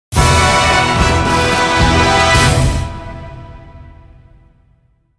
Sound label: Jingle Home; culdcept revolt original soundtrack dynamix 2017